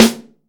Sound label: MAPLE SNARE